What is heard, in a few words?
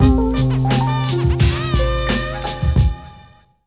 Bizarre, Fanfare; 1997 MMX LAN PACK (win 95); Worms Armageddon